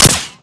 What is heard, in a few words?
usp45sd, weapons; Modern Warfare